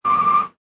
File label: Car Or Motorcycle Brake, Tyre Screech; Auto, car motorcycle or motorbike braking, accelerate or steering screeching sound.